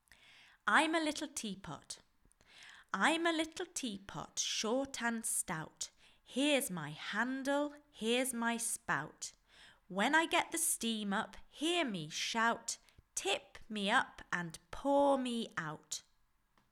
I'm A Little Teapot

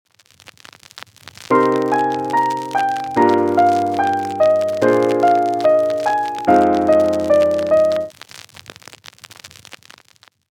Piano

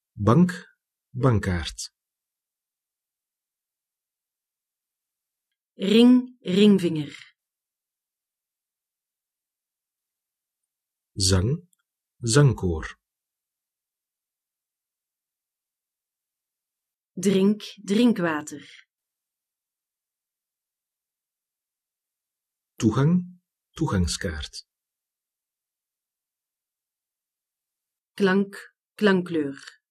ring

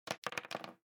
dice

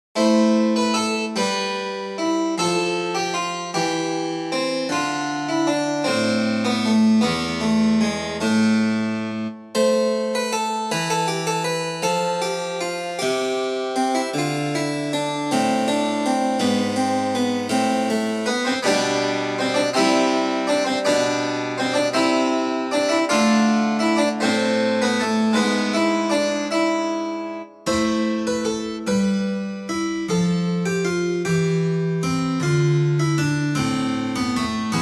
Cembalo

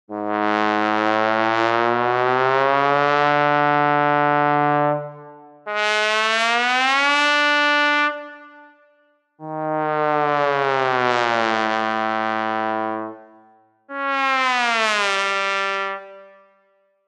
TROMBONE glissanda

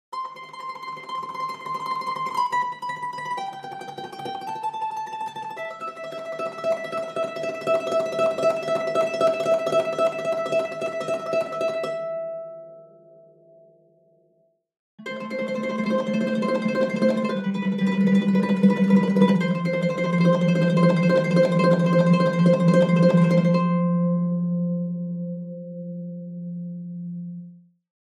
ARPA bisbigliando

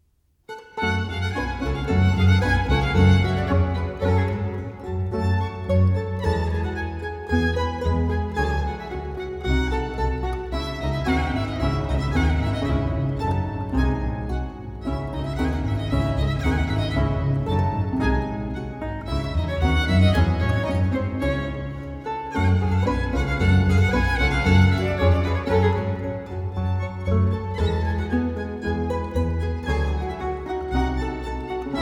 Vivaldi Trio g moll Allegro

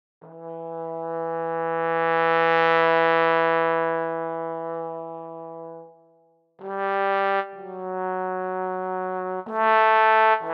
TROMBONE

